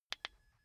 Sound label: car key button click signal quick